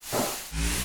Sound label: fist draw, weapons; Dystopia